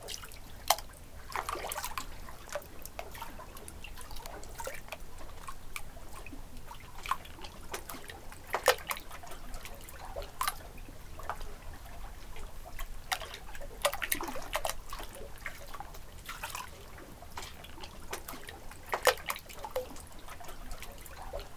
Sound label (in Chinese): 水花防洪石头护坡; 海浪聲; 自然音療